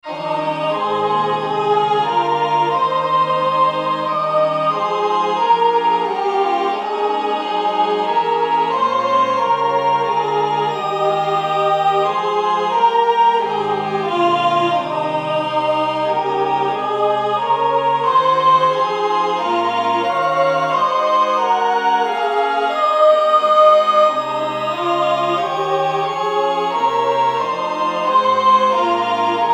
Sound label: tune hanover with tune houghton as descant, church choir; Original Compositions for Church Choirs